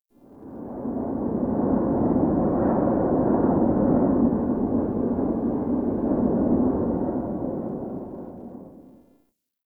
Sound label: Forest Half Open Cave Wind, Ambience; Warframe; Grineer